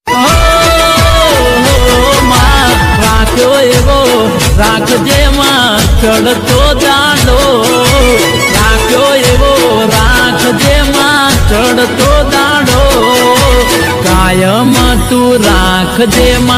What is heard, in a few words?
song gujarati ringtone